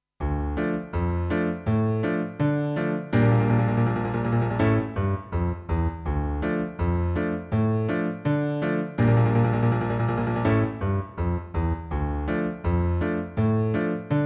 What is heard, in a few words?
Backing